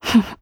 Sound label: laugh; Eternal Return; Katja; KOR